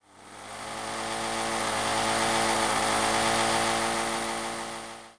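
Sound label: WEED EATER, residential; zones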